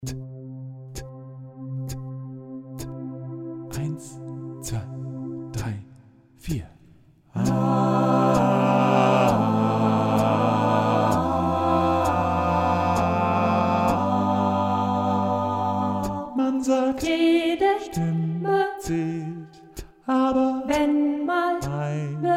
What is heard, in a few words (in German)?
Jede Stimme Bass